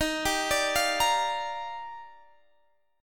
D sharp Major 9th

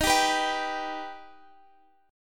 Eb Augmented, Guitar Drop D; x,x,13,12,12,15; down

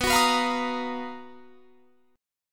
B Major Sharp 11th

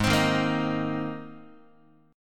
G sharp Major Add 9th, Guitar; G#add9 strummed; JGuitar

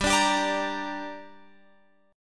G Minor G down Guitar Open F, chord; Gm strummed

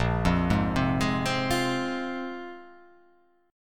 A sharp 7th Suspended 2nd down

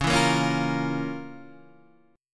F Major 7th Suspended 4th Sharp 5th Db

